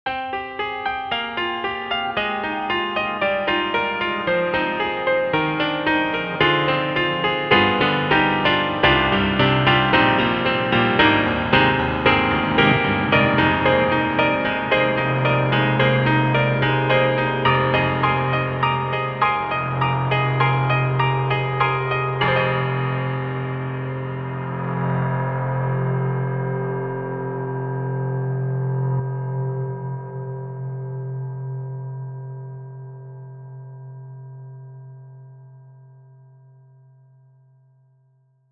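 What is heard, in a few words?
Treated Piano Reverse; Cakewalk; Rapture Expansion Pack Biolabs Vol.1; bio Treated Pno Reverse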